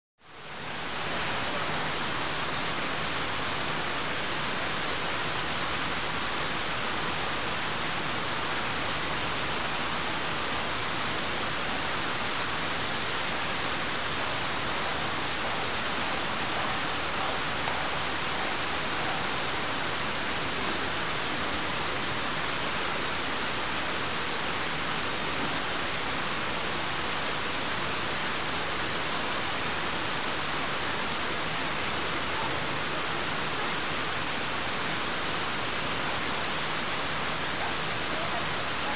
Medium Perspective. Constant Water Rush With Fine Water Spray. Some Very Light Walla And Movement That Is Covered By The Sound Of The Fountain.; Fountain In Park